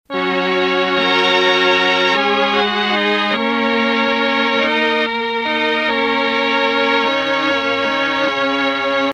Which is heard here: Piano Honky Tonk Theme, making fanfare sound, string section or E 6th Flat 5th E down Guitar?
string section